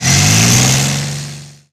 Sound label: L2 Engine Acc Start; DCS